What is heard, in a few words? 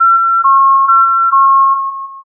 ambulance